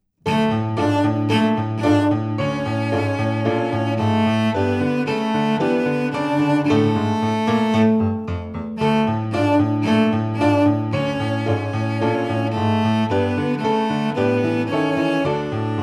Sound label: Cello